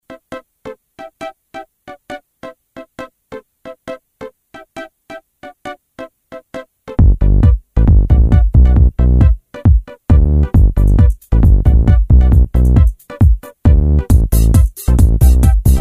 house music alarm

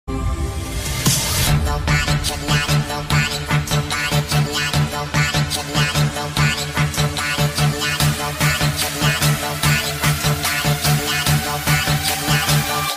headphone remix

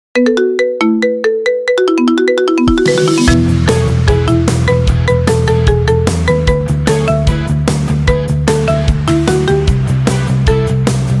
marimba ringtone; tiktok; Dragon Ball Z

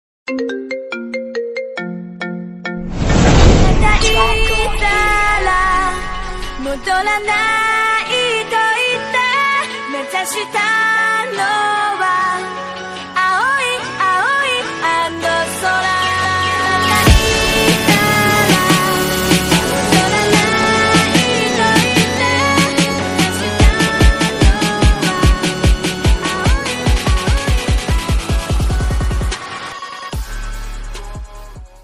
ringtone iphone; naruto shippuden

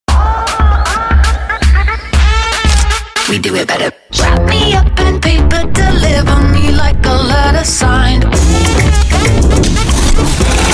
Ad Song; Galaxy Tab S8 Ultra

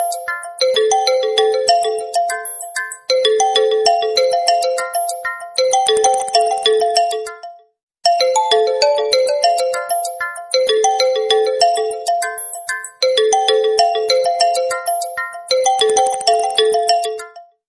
vivo y11 caller

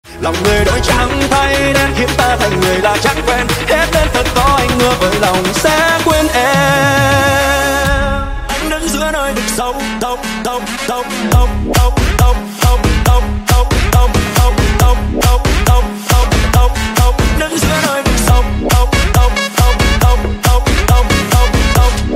remix

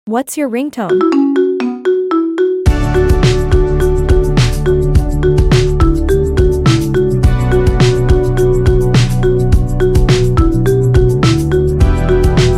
marimba

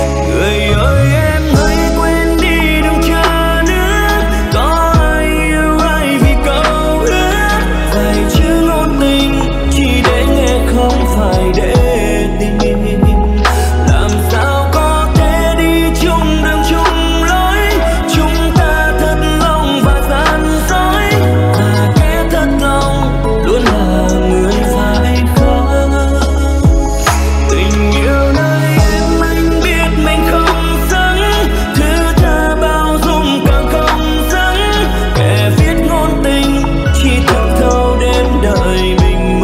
Lofi Ver

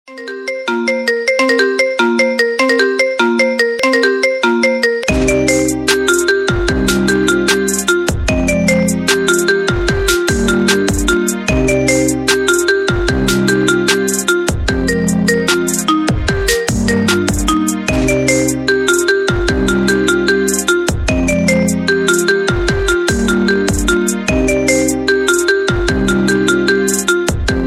sad marimba

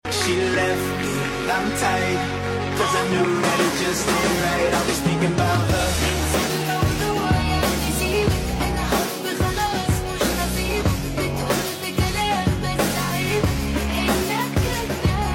remix arabic song

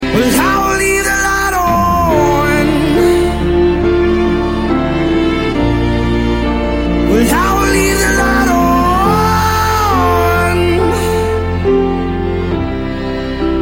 Acoustic

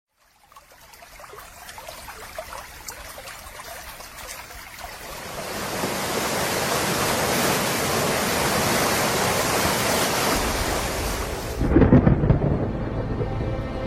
water drop; iphone

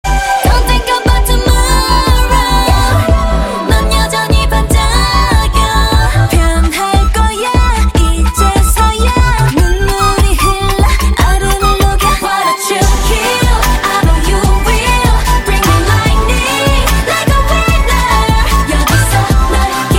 kpop

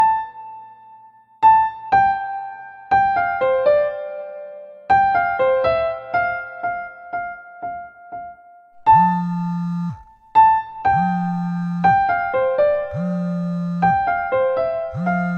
nokia real tone piano

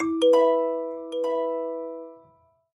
samsung tono

